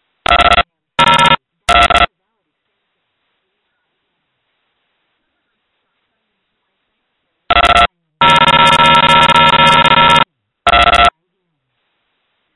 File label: cell calling